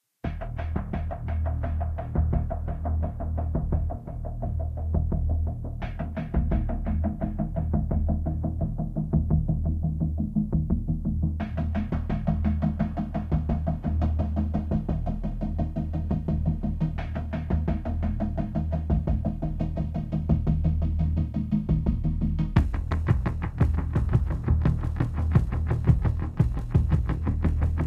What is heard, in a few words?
Pulsebed